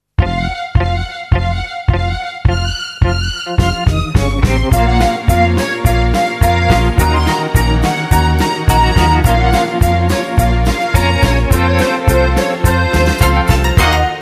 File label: sound fun, Funny instrument